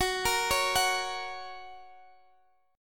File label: Gb Major Gb Guitar DADGAD; x,21,20,18,21,x; down; chord shape